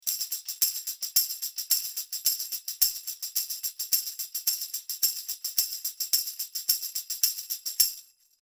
Tambourin close; Oktava MK-115

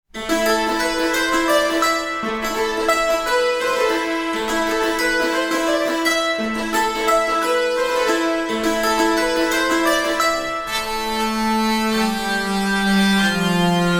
Fantasy Strings

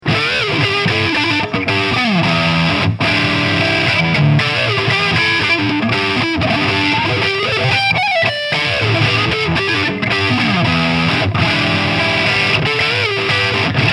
EVH Wolfgang Standard Lead

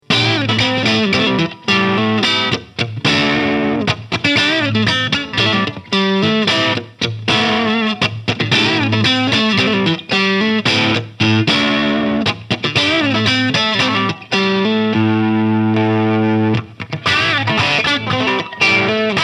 Dean Exile Select 6 String Multiscale Crunch

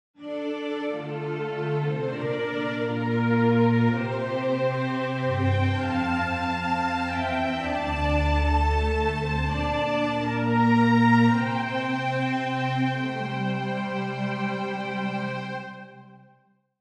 Strings; VSL App

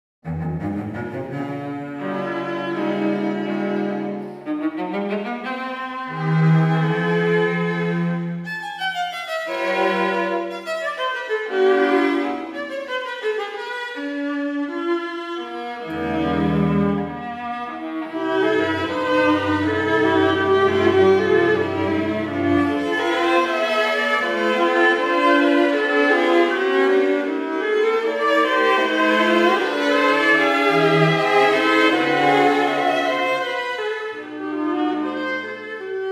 Trio mix

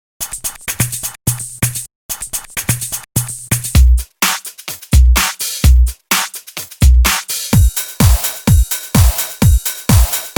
Loop Definition

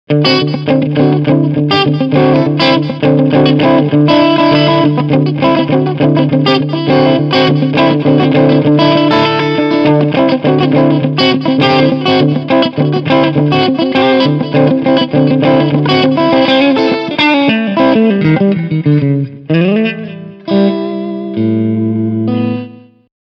Super Twang; Preset Hoey's

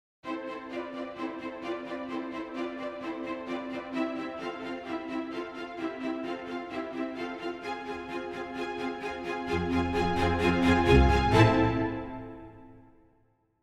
Full Strings Stacc

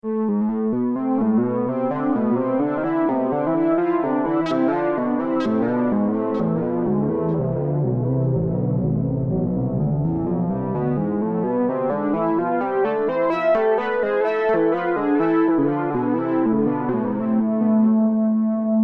Synth Delay

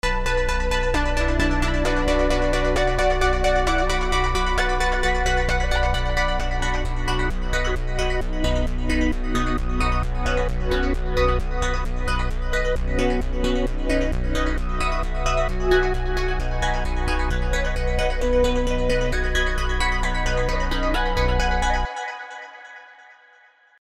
Avenger 2 Minor Changes; Vengeance Sound; Generative 1

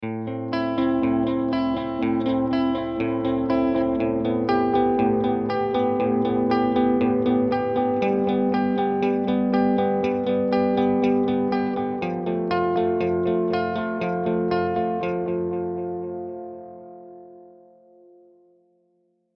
Clean Delay

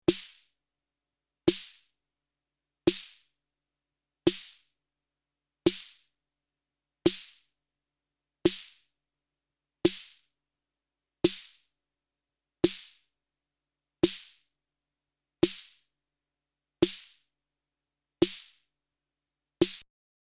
nvelope snare hardware; Elysia

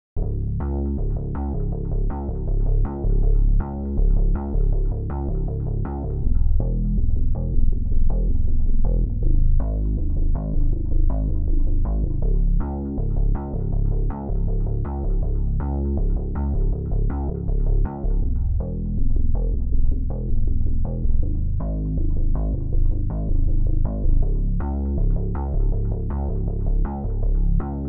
Bass Guitar FX; TB Lowtone

